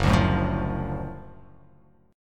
F sharp Minor Major 7th Sharp 5th F sharp down

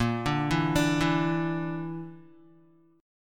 Bb Major Flat 5th Bb down Guitar, chord; BbMb5 arpeggiated

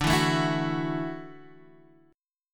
E 7th Suspended 2nd Suspended 4th, chord

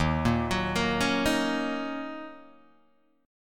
D sharp Minor 7th, Guitar, DADGAD

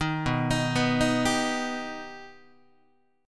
B Augmented B Guitar, chord; arpeggiated; JGuitar

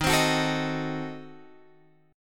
D sharp Diminished 7th D sharp Guitar Open F; strummed

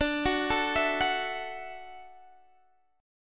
D-Major Add 9th, D, Guitar, Half Step Down

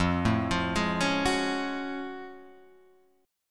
F sharp Minor Major 9th F

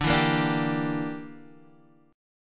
E Minor 7th Flat 5th D

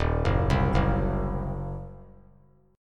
Eb Minor 6th chord; arpeggiated

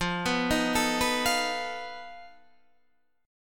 F 7th Flat 5th F down

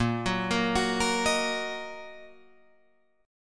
A sharp Suspended 4th Sharp 5th Guitar Drop D

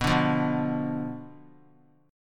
B Diminished B down; Bdim strummed

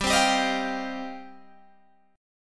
G 7th Suspended 4th Sharp 5th, Guitar Open G

